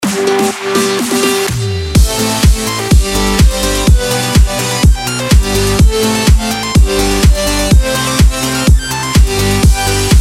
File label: Happy Electro Birthday